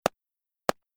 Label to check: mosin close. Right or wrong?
Right